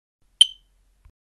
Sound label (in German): Batterieleerstand, rauchmelder; EI Electronics; Ei6500-OMS